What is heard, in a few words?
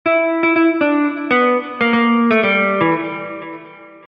Blues Guitar Sound